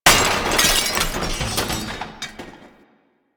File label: Desktop Glass Metal Destroy; ambience; Warframe; Entrati